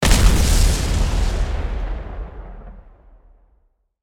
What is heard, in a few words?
heavy ground explosion layer; War Thunder